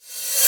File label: REVCYMB; BAS PERC; eXtended Instrument